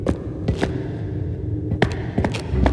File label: footsteps stone; We Were Here Forever